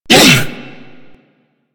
Hammer Boss Pain, Vocals; Warframe

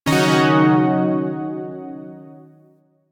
Shawzin Yo Chord L; Zariman Shawzin

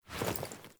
Foley Action Default Jump, Foley Default Clothing; Characters; Player

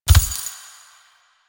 Run Start; Warframe; Kalymos

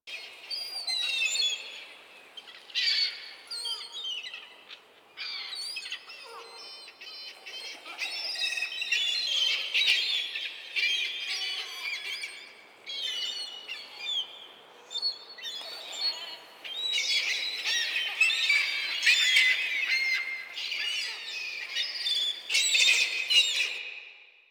Seagull Shore Loop; Ambience; Props; Loops; Eidolon; Warframe; Eidolon Plains

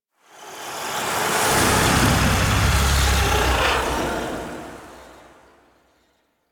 Transition Stinger; Entrati Labs; Epilogue Cinematic

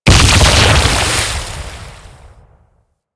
Cannon Explode; Warframe; Warframes; Pirate

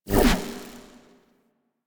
Experiment Pistol Shot Stop; Crp Sent Experimental Pistol

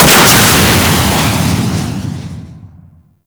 Tunnel Fire Start; Ambience; Grineer Spy Vault; Warframe; Grineer Galleon

